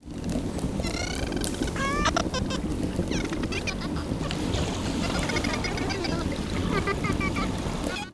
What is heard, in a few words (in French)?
dauphin